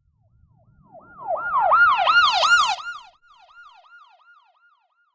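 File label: alarm siren, filter processed, 170bpm; musicradar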